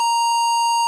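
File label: pico A#4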